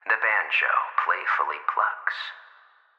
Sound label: Banjo